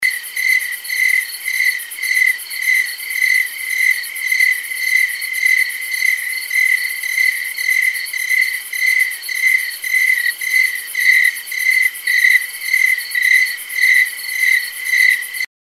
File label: Cricket Chorus